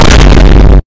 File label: boop